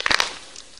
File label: bite, nosalis creature; garrysmod; metro